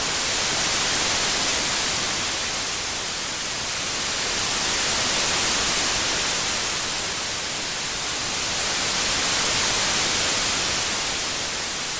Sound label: static bed